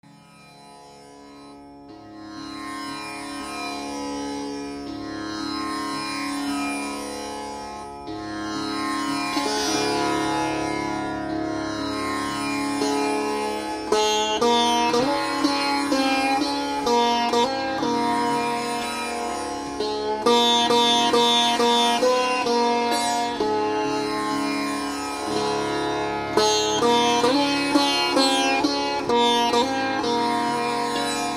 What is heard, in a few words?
sitar tampura tabla